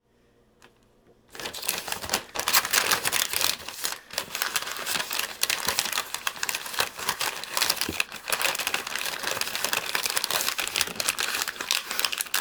wrapping a present

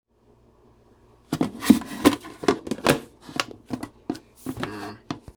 opening cookie container, with 'mmm'

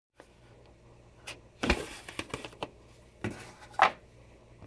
opening cookie container

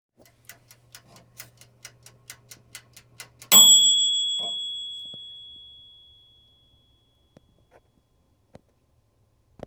oven ding; Christmas dinner

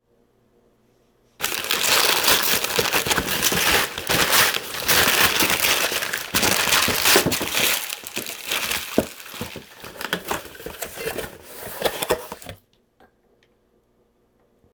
unwrapping a present

